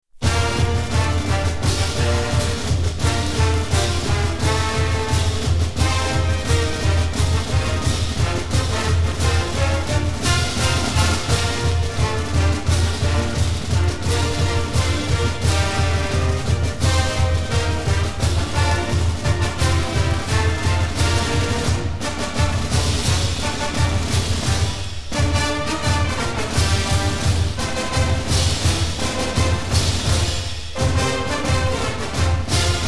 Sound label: Fight Song; CMU